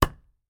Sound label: wall wood; Golden Crayon Sounds